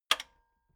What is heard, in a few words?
metal button radio spring low